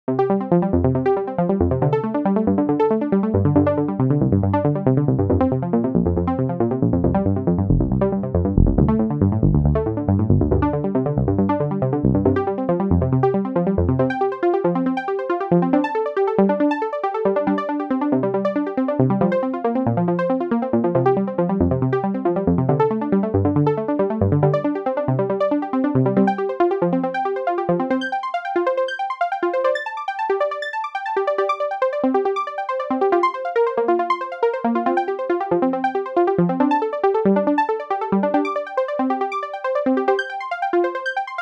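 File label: Fig Arp, dry wet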